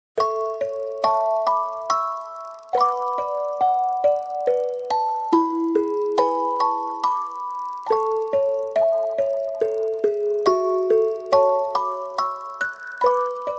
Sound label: magic, volshebnaia muzyka dlia fona bez slov